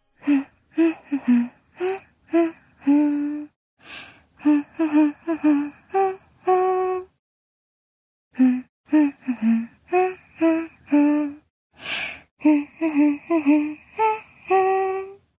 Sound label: humming; sachi; sao